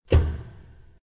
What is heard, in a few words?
Mech Lands; doodle jump 2; android